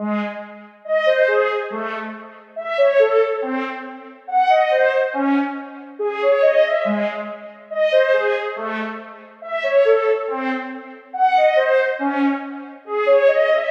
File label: Prophet 6 lead, 140 BPM C# Min; Stems; Cymatics; Kaleidoscope